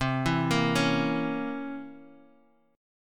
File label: C 7th Flat 9th, Guitar, Half Step Down